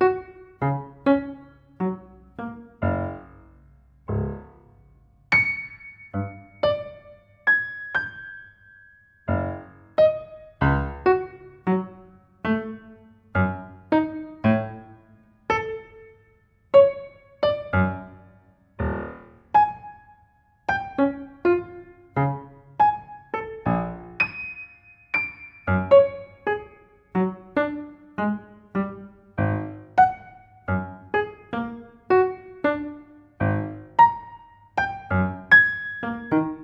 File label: Note Brassage, Piano; Piano Miscellaneous